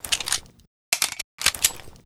beretta reload; STALKER

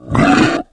m boar hit; STALKER

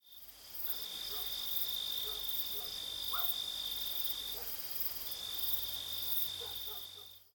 ambient cricket; STALKER

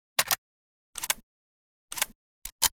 toz34 reload; STALKER

